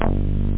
bounce; Bass

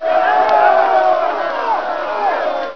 melee; STRATEGI; CIVIL WAR

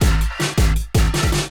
DRUMLOOP D+B 160, Drum+Bass Loop; eJay

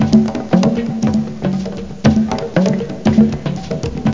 perc loop; OctaMED SoundStudio V1

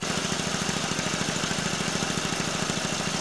MOWER; Mr. Wonder's Greatest Toyshop on Earth; OmniMedia

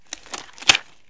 glove off; citylife; business

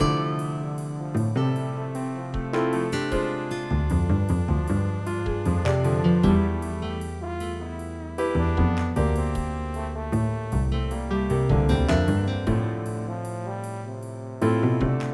jazzy; Exploring the Boundaries of Sound & Vision

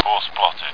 radio; moonbases demo; amiga

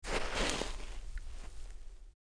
player snow

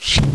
MOVEDOOR; STARMADA

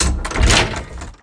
open chest

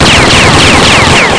bunch o bullets

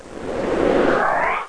Geo Map Zoom; Let's Discover Michigan!

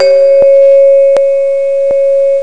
bell

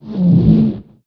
rub; BESTSHOT; noisybit

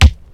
BASSDRUMS; DRUM SOUNDS

